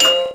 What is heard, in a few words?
Bally 1000 points bell